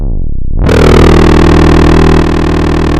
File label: pop, chainsaw